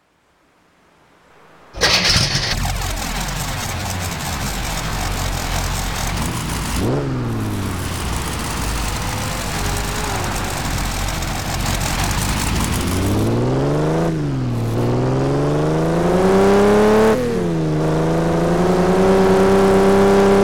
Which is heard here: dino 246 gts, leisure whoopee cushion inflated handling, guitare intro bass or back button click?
dino 246 gts